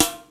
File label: House sd, Roland JD-990, Drum Samples